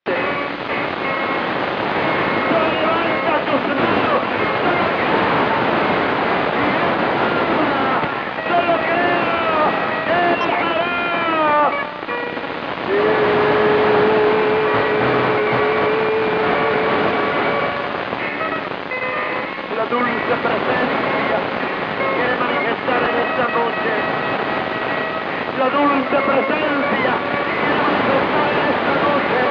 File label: fm music; received the enclosed interferer on the downlink of VO-52 (on 145.910 MHz in FM)